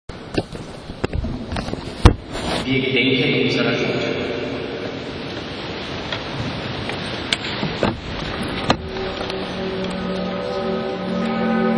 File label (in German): Glocke; Nurnberg; Wild; Iglau